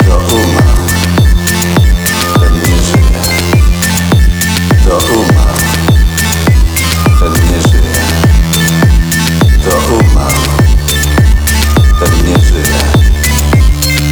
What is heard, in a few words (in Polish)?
elektronik